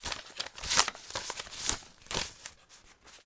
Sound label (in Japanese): 札の音; ボードゲームやカジノ、麻雀の効果音です。